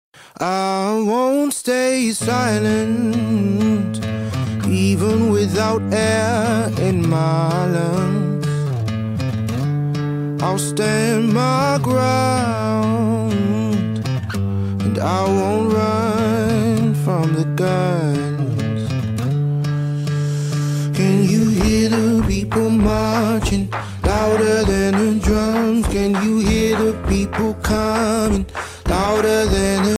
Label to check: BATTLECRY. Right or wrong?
Right